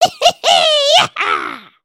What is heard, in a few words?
cheer